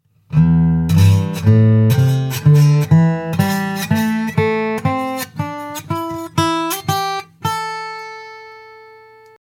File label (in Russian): Слайд гитара